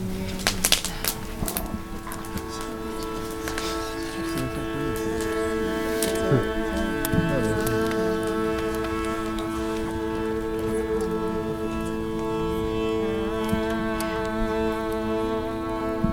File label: hindu kinda chant